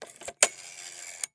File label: Rotary Telephone Dial; American Fugitive